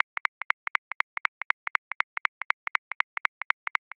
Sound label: Rock Tight Light Swung 16ths; Grooves; Ableton Live 12 Lite; Core Library